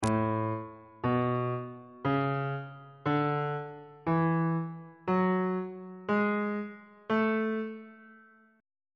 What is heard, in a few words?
b double flat major note positions on bass clef